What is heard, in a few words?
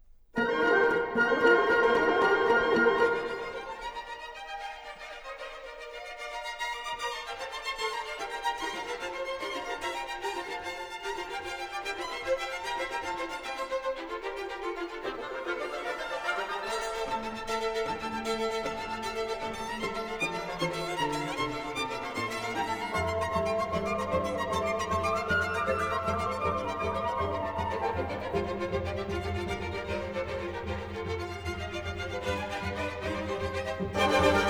Pulcinella, ballet with song, orchestra, Tarantella